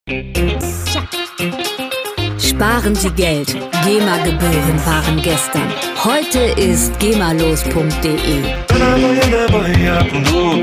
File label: brazilian vibes